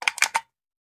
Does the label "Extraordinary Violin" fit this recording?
No